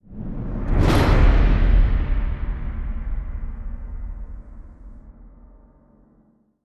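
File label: sting, cymbal big hit; transformers war for cybertron gamerip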